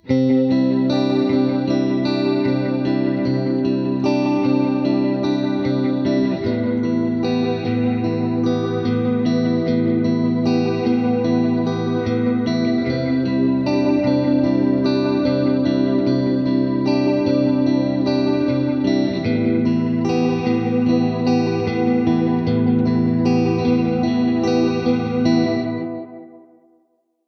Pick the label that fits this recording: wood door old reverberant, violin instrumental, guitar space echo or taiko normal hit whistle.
guitar space echo